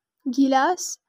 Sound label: glass